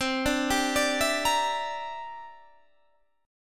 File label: G Minor 6th C; Gm6/C; arpeggiated